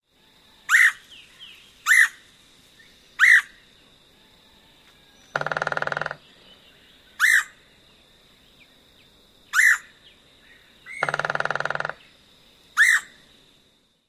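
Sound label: querr calls and drums, Red-headed Woodpecker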